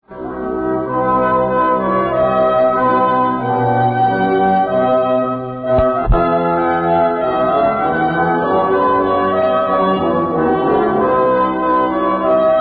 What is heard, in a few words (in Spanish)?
bronces; coros solistas; charpentier; tedeum